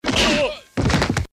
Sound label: punched